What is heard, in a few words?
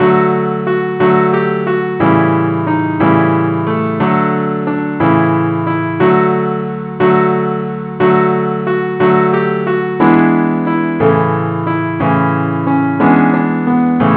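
simple accompaniment key d, keyb; angelvoices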